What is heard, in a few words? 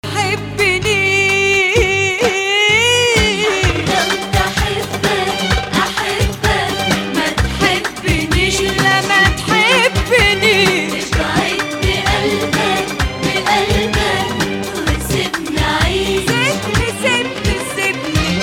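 Hijazkar, Arabic music; Hijazkar 8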